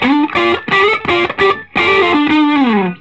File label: SOLO, Vintage Blues Guitar; USB Soundscan vol.22